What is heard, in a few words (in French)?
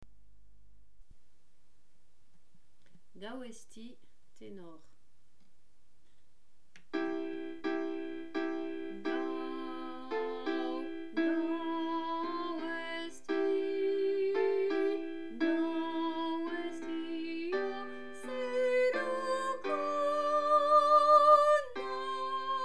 vx Training Tenor Chanté; Gau Ezti; tenors